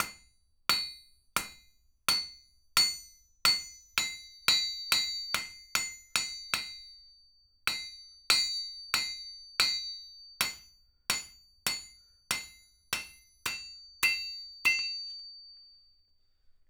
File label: Hitting Anvil